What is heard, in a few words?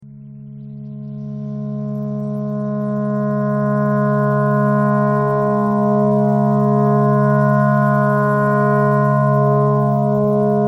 clarinet clarinet simple